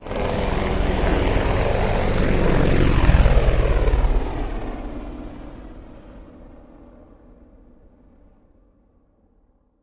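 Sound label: Apache Medium Slow By Close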